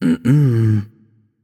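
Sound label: nonverbal disagree; voicepack; smite; merlin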